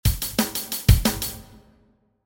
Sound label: beat